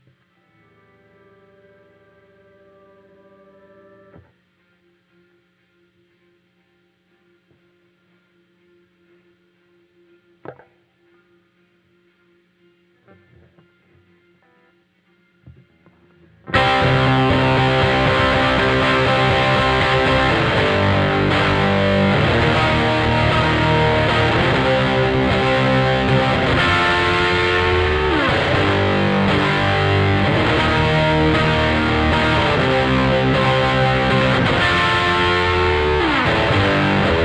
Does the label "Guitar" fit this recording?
Yes